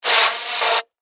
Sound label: Pneumatic Release, Scifi; Science, Fiction; Spacecrafts; BlastWave